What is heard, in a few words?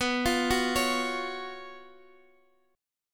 F sharp Major 7th Suspended 4th, B, chord